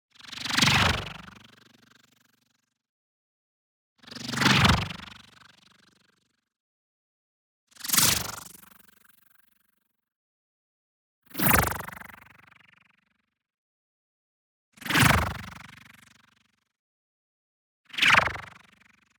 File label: NEON WHOOSH SMALL FAST Fizzing Energy; boom library